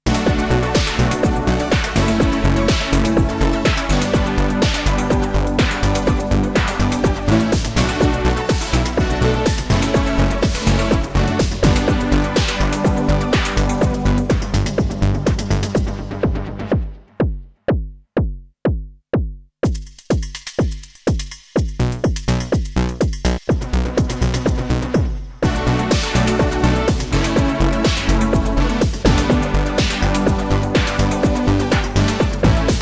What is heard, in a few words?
ARP; redshift6; ARP Club Tampere